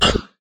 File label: NPC Hit